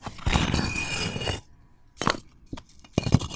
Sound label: turn grind; dfcaverns; hunter statue